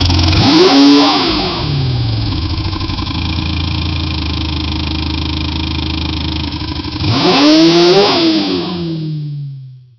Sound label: supercar engine high rev